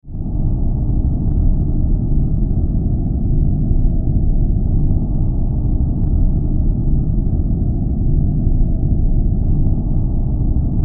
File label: Planetary Rumble, Ambience, Planetary, Rumble, Science Fiction; 5.1